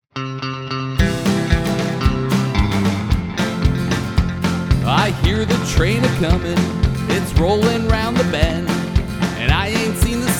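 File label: Scarlett 2i2 Studio CM25 NO Air Setting; focusrite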